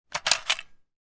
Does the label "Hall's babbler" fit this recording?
No